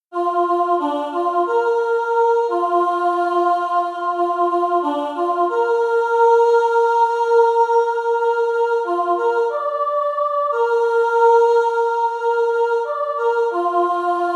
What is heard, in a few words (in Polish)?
spiew; Puchacz